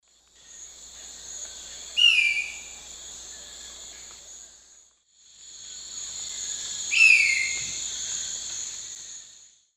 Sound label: Xiphorhynchus ocellatus, call; S. America; Eastern Lowlands